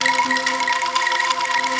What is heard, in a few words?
anklungs, Roland JD-990; Drums